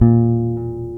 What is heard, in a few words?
BASS OVER, Electric Basses; E-MU Producer Series Vol. 8 – Platinum Phatt